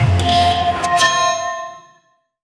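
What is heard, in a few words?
death chime tactical video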